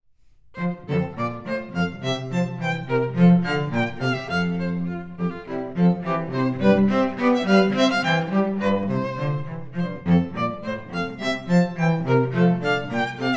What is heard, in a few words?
bourree from water music; handel